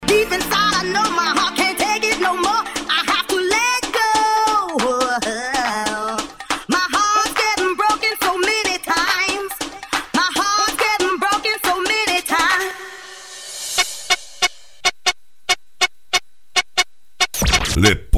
deep house

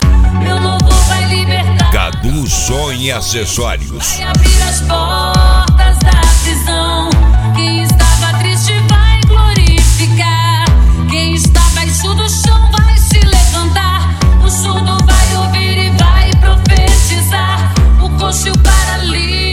gospel

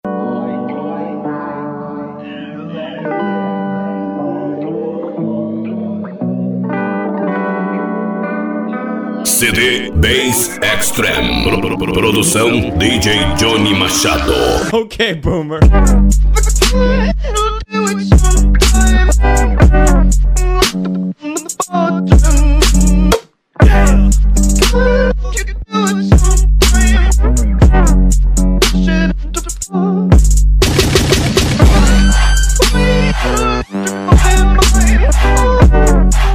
bass extreme hip hop; carretinha apiai

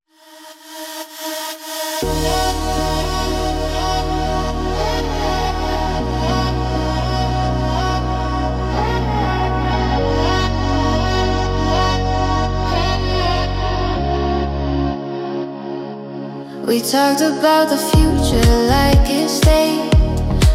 house

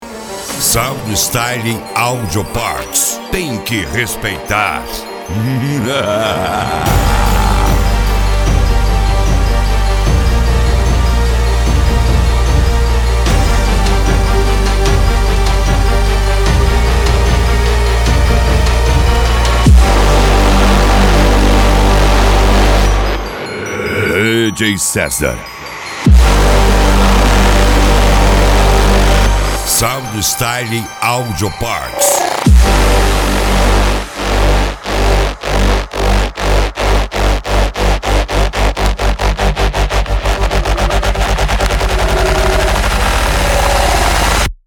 sound style dubstep

